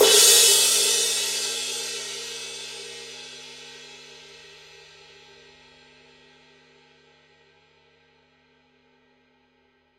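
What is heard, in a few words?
signature precision 20 ride edge, cymbales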